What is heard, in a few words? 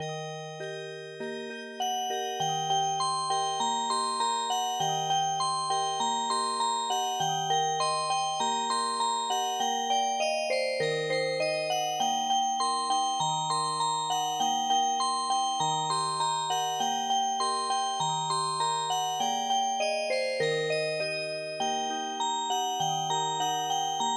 Music Box